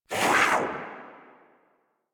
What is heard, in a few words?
Kavat Vocal Attack Short; Warframe; Khora